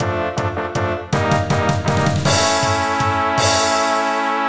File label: Fanfare